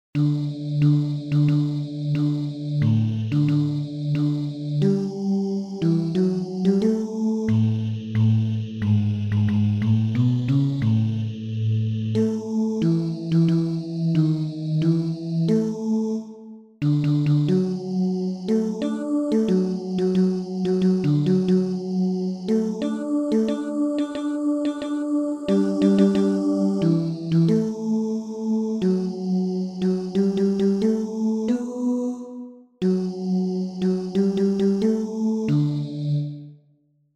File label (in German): Bass; In Bekuemmernis